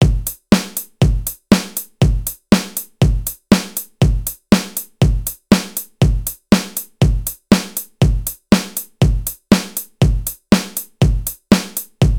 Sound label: gated reverb just the drums dry